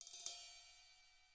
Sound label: cymbal ruffs; ILIO; Double Platinum Drums 1